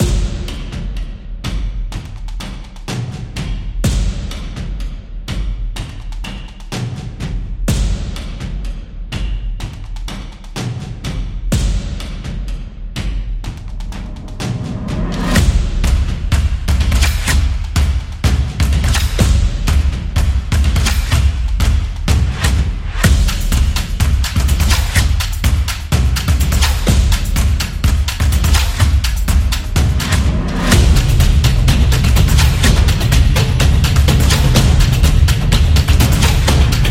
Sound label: The Percussion